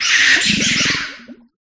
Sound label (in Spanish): Grito; Munkidori